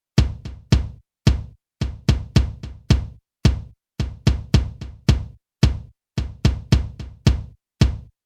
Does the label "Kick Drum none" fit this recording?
Yes